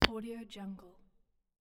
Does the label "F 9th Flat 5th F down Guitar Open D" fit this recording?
No